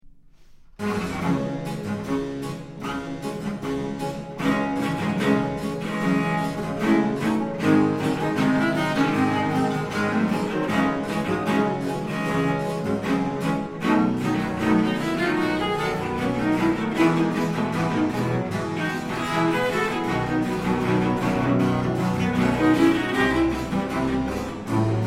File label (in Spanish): Tango congo; Folias Americanas